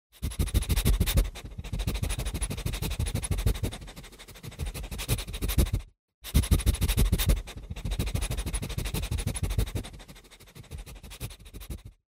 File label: Sniff sniff